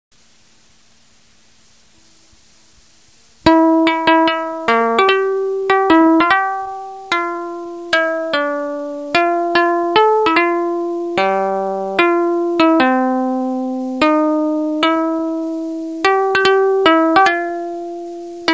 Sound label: English folk tunes